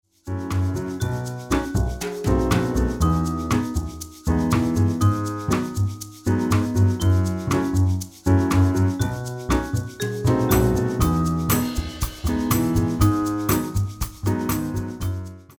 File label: accompaniment